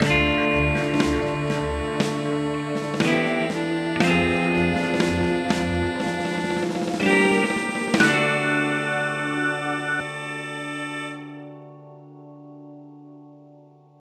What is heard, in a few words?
Music Sting; Cactosarms; mushroom men the spore wars; wii gamerip